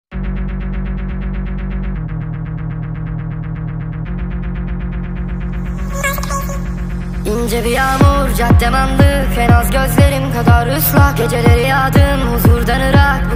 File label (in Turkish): speed up